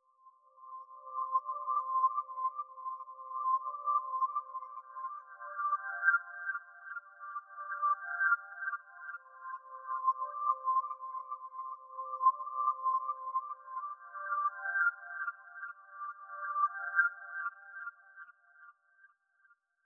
Reverse Ear Candy, 110 BPM G Min; Cymatics; Saint Tropez; Infinity Melody Collection

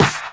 Clap, Bonus Drums

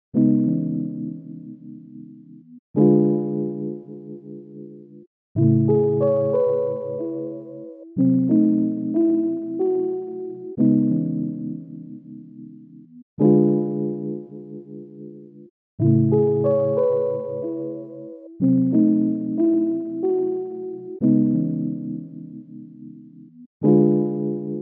92 BPM C# Min Piano; Loop Stems; Cymatics; Ballad

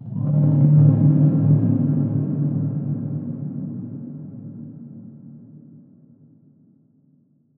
Atonal Depths, Psytrance

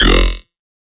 Riddim Bass Hit F; One Shots; YUH; Ghosthack; Riddim Essentials 2020

